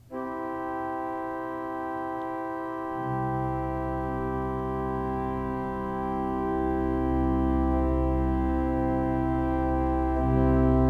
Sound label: pipe organs; FirstLondonUMC